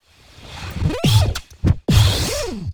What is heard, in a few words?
fx impact mid rubbing A